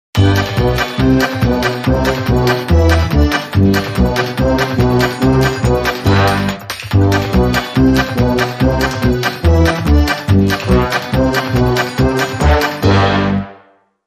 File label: Country Polka, Sting